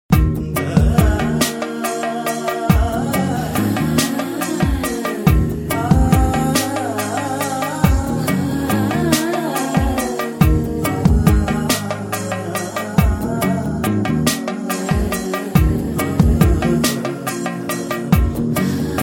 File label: classic ringtone